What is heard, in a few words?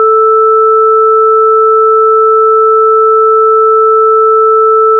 sine 440Hz multiply sine 880Hz